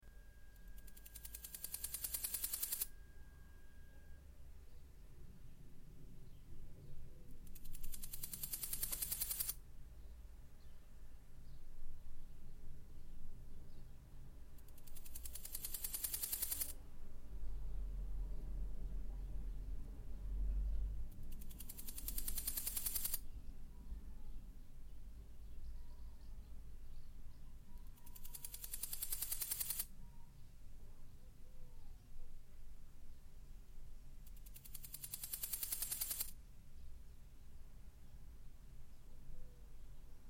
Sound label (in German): kleine goldschrecke, heuschrecken; Natura 2000